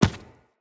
Infested Medium Run Default, Footsteps

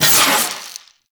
Proj Tap Whoosh; weapons; Warframe; The New War; Omicrus

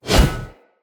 Whoosh, Swords

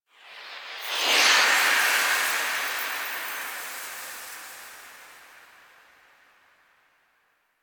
Portal; Ballas Lotus Operator Intro; Warframe; The New War; Cinematics

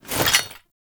Generic Foley; Warframe; Warframes; Hoplite

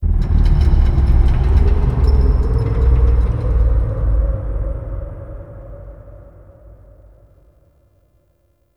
Guild ship thrusters, Ambience; Warframe; Misc Remaster

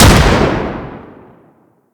Weapon Beam Combust Explosion; Mods

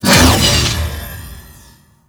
Enemy Spawn; Sentient Condrix

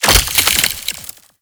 Void Heavy Scythe Crystal Detach; Warframe; Duviri; Incarnon Adapters; Melee

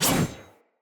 Sword Whoosh Heavy, Weapons, Player, Swords; Garren Sword

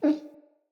Wolf Cub Sleep Idle Yip; Vocals; Animals; Orengal Wolf Cub

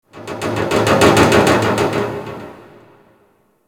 Ice Metal Rattle; Warframe; Corpus Ice Planet

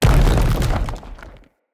Arena Rock Fall Impact; Bromius; Abilities

